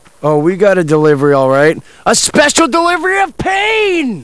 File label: pain; Jay